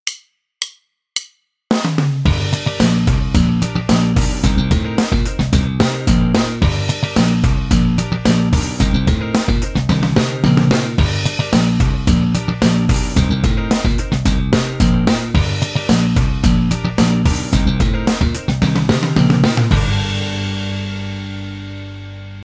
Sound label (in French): slap exercice, basse